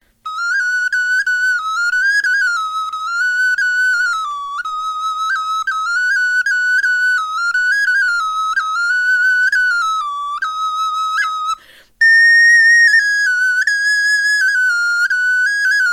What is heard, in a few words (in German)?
melodie